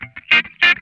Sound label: GRAINY WAH, Funk Guitar; Zero G - Funk Guitar